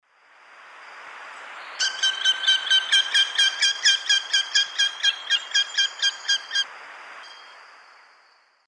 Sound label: Falco deiroleucus, calls perched male; S. America; Eastern Lowlands